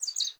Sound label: Sparrow Song; Animals; Vocalizations